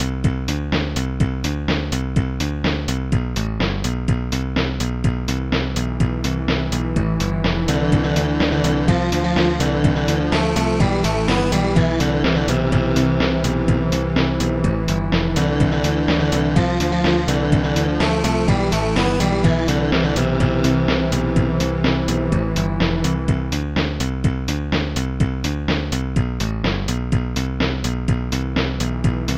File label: survival music; blockblank